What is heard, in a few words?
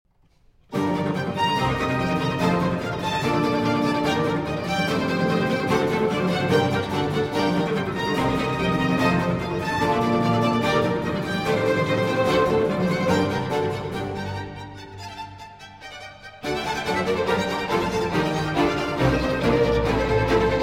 Concerto For Strings and Continuo in G minor